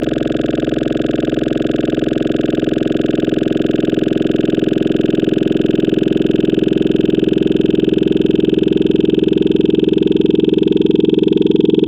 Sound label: Vocal Ahhh; Wavetables; Synthetic's Serum Collection; ESW Core Wavetables; Echo Sound Works Core Tables